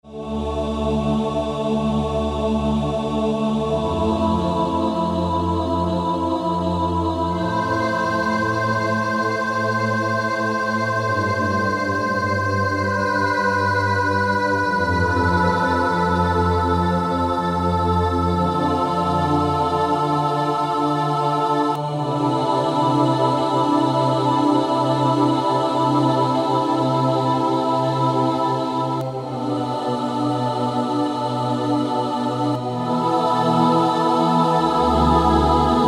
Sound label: Ohh-Ahh Female Choir; IK Multimedia; SampleTank Free